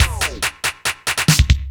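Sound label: DRUMLOOP ELECTRO 140; Techno2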